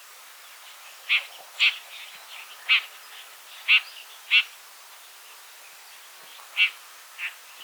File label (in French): pie grieche ecorcheur